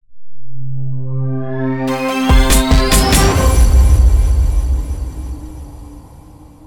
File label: Transition